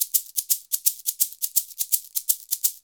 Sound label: SHK, Shaker; Univers Sons; Basicussions